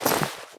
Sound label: foley step; npc